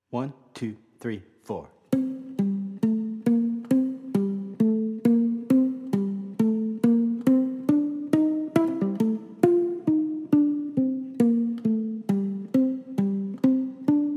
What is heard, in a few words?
uke